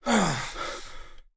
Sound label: dodge success; Pitch Black - A Detective's Demise